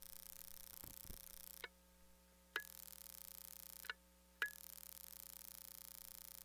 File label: Light Switch